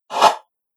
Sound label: Rough Swipe, whoosh